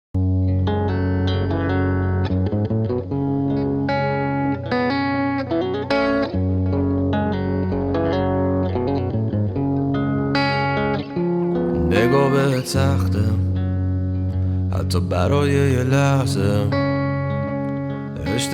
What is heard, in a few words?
Guitar Version